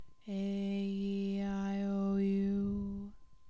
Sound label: aeiou Low